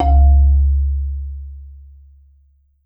Marimba C#1; Sampleheads - Dave Samuels Marimba & Vibes

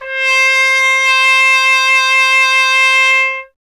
TP C; Miroslav Vitous - Solo Instruments VOL-1; Trumpet

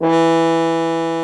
FR HORN E2, French horns; Greytsounds VOL-1

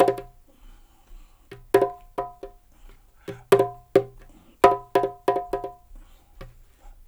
135 BPM, perc loops, dub; Drumdrops In Dub VOL-1; 130-140 BPM

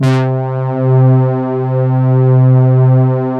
FATTY PADS, JV PADS; Pro-Rec - Synthsonic

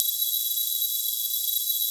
D.PIANO HP200; Analogon

